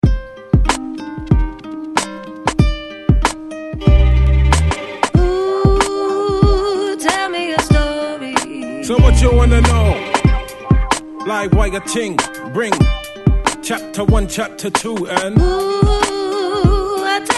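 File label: hip hop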